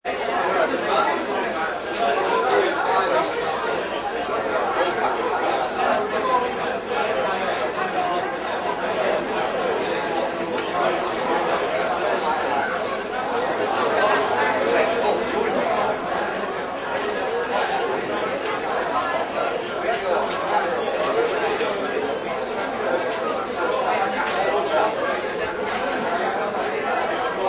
Large Busy Bar, Bar or pub crowd ambience. Large, busy bar. Heavy conversation, drinking; Heavy conversation, drinking and eating; Bars And Club Sound Effects